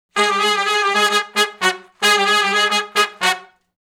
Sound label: Funk Riff (F#) unison, Horncraft for R&B; Sections